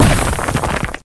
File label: diving land